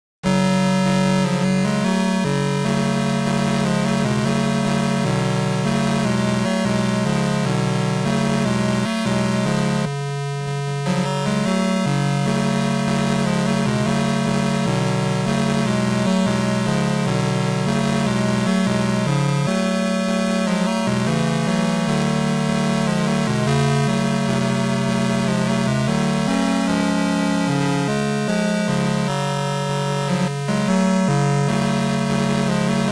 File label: Combat; u3-atari